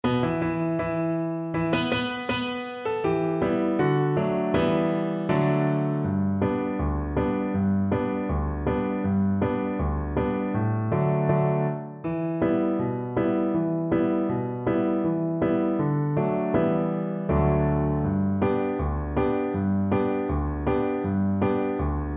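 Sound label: VLC karaoke, Cello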